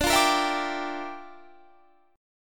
G Minor 13th D

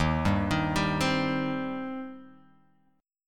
D sharp 6th, D sharp, down

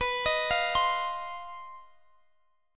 C Minor Major 7th Double Flat 5th, B, chord

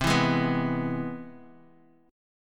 C Augmented Major 7th, Bass Standard; strummed; chord; JGuitar

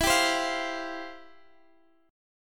C Suspended 4th E; Csus4/E

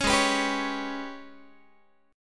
G sharp Minor Major 7th Double Flat 5th, Guitar; G#mM7bb5 strummed; 16,16,14,17,17,x

